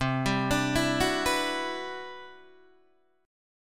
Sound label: C-Major Sharp 11th, Guitar DADGAD